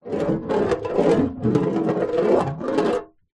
ball bearing roll on thin hollow metal short; industrial; zapsplat

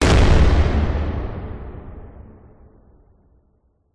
explosion internal loud bang blow up safe

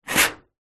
foley metal scrape large loud short

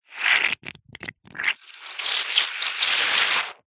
foley liquid frothy squelch squeeze slow hard

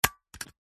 office stapler single staple into paper

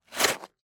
ICE Skate scrape two legs rotate

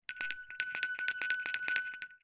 bell small decorative bell ring

